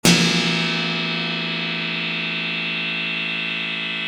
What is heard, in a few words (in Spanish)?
Echo y mucha feedback